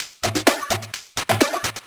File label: VFX2 Loop 128 BPM